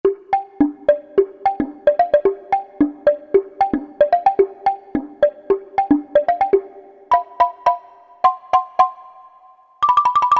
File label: prc; E-mu Proteus FX; Blok Hed